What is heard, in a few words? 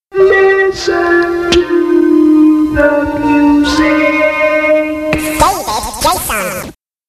Jingles